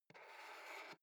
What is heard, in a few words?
foot switch dimmer slide down slow